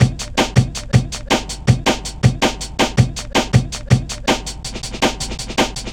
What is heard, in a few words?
helicopta, drum loops; Zero-G Jungle Warfare 1, 2 & 3